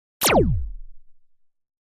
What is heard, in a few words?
Laser Quick Fire Trem